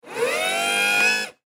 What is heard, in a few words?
Ambulance Stretch, Ambulance Stretcher Cot Lower Motor Rev Short; Emergency